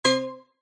multimedia game tone synth bright organ style short stab warning error